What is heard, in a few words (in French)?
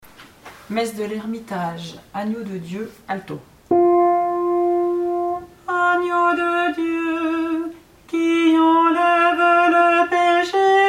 ALTO; Agneau de Dieu MESSE DE L ERMITAGE